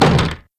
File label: roof close